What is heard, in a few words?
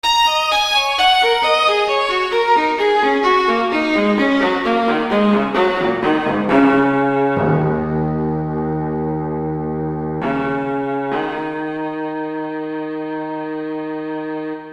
String